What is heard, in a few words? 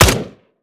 akilo47 smg; weapons; viper